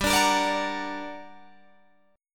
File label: G Minor Major 7th, down, Guitar, Open G